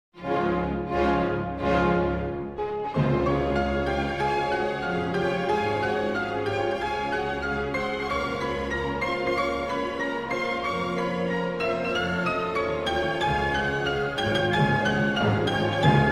Piano Concerto No. 1 in D-Flat Major, Allegro Brioso